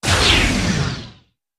Heavy Caliber Lazer, zaps

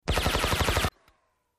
Silenced Machine Gun

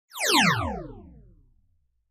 Descend Short Digital Descend with Whoosh

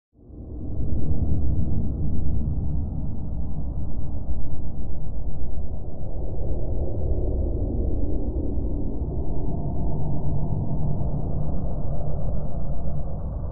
Ambience Planet, drones

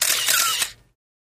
35mm Camera Auto Win

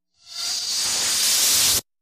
Strange Reversed Air Releases Vary In Pitch

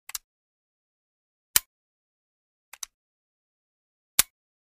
38 Marlin Rifle Dry Fi; foley gun movement

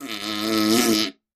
Bowl Fart Pushed; farts

